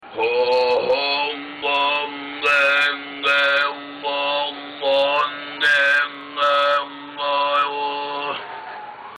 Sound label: khoom tongue, khoomei